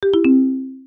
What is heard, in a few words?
agent disconnect